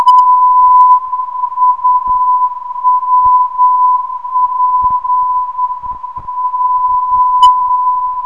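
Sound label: non-directional beacon; UWP; 323kHz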